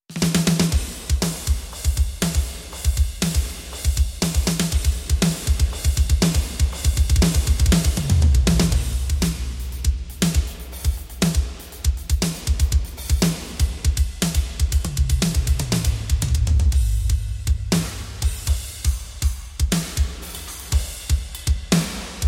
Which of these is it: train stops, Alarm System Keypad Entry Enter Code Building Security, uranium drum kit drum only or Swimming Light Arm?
uranium drum kit drum only